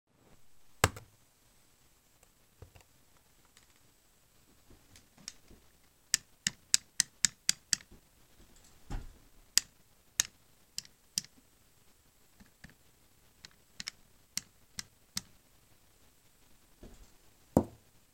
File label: Clicks; ludum dare 46